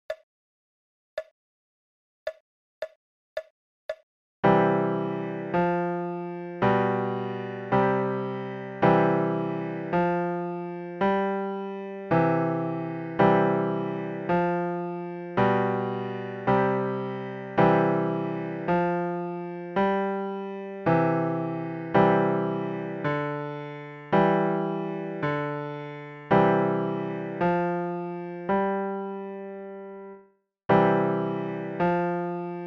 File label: English Country Garden left